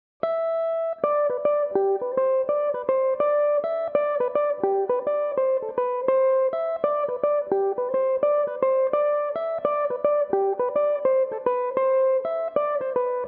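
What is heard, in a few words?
G major, Irish mandolin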